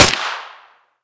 mp5 40cal suppressed fire

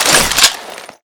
ammopack; serioussam2

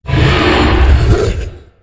alert, dragon

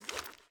generic magpouch mg, weapons handling

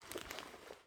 generic cloth movement, weapons handling

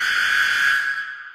door alarm; ttt foundation

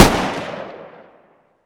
ak47 distance fire; weapons

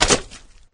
jump, flood

